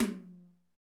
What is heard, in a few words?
TOM TOM